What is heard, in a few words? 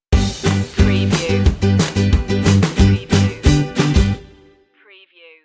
life stinger